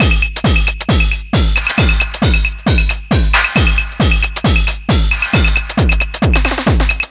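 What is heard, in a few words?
drum loop 135, right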